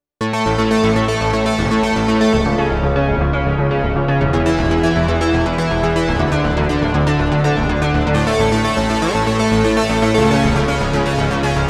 Oberheim OB-1 Kristall Arpeggiation; GForce Software

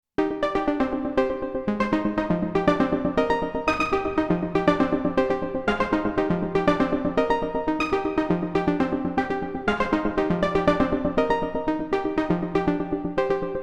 Polyend Play Electronica

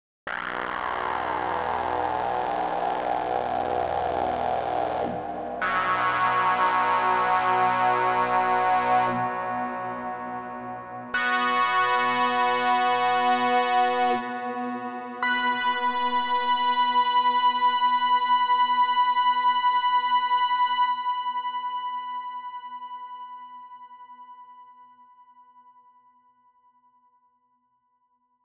EMT 160 Clean Mix50 Decay 16s; SuperPlate

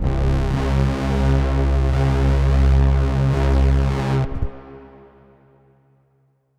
Polybrute 12 Bass

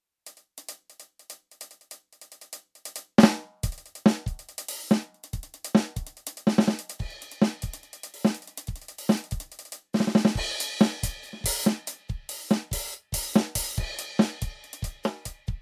Kit Q Splash; EFNOTE 3; Kit 4

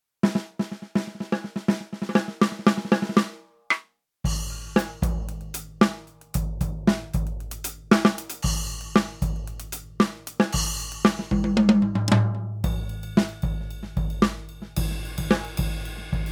EFNOTE Mini 2 mini Kit; firstnote

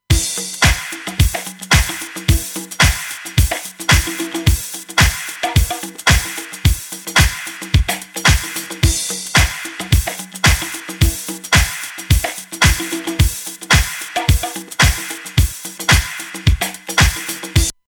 Mastering Limiter Beat Clipper; Bettermaker V2

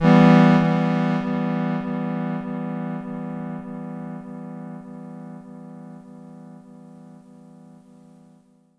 Synth dynamic Pan; TC Electronic 2290 P

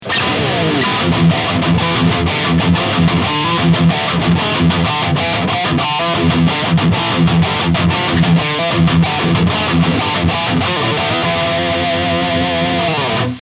ESP LTD KH V High Gain; Metallic Gold

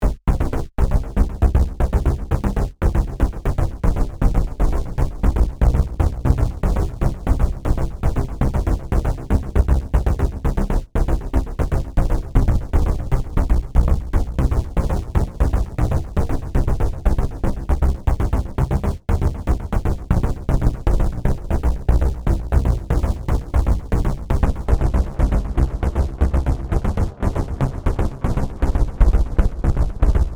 Reverb Echo ARP 2600

